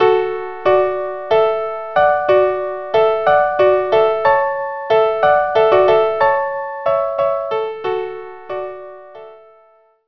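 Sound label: Hornpipe violin unaccompanied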